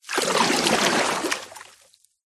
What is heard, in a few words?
nature water deep surface movement slosh